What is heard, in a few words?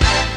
HIT, HIPHOP; Rubber Chicken Software Sample Bank